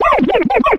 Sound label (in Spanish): Grito de Rowlet